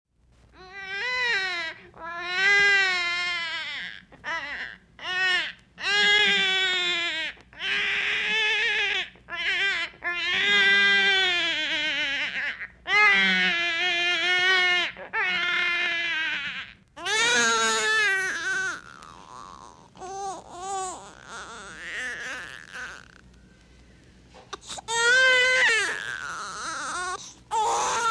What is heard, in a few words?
Baby Crying; Babies; Voice; recorded in a custom built sound booth; Bobby Cole